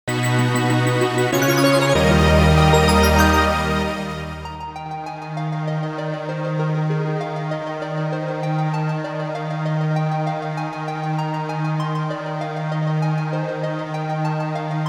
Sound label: Elemental Stone Acquired